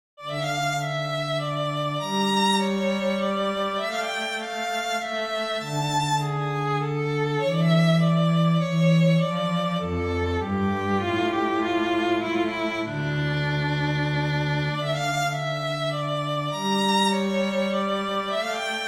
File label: violin cello, D minor; Domenico Scarlatti; sonata K.32